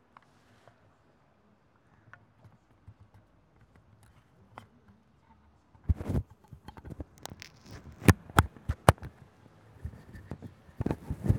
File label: Bicycle Bell